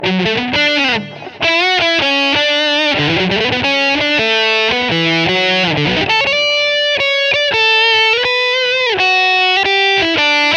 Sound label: Gain Bridge